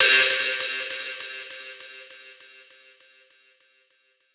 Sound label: Stronger Oneshot C; shimmer